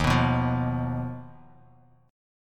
D sharp Suspended 2nd Sharp 5th D sharp Bass Standard; strummed; JGuitar